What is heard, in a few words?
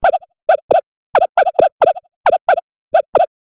ringer; Ringtones